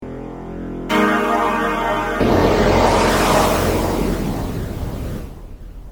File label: Noise Synth; V-Synth